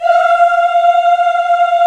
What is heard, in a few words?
VOX, African Aahs, African Las